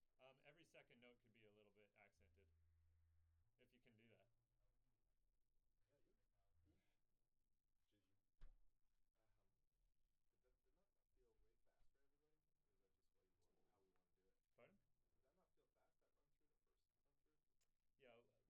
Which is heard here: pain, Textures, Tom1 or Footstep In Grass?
Tom1